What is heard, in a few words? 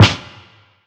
bassy acoustic snare g sharp key; snare drum samples